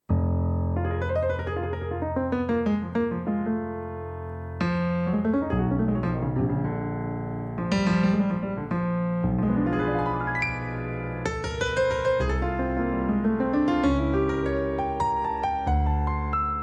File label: Boisterous Blues